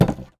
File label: Foley